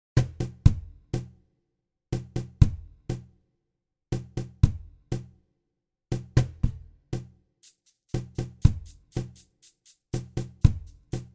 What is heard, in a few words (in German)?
stadltur reagge nur perc.